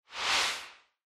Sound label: Camera Motion Mid; カメラモーション04-1(中); シーン・カメラモーション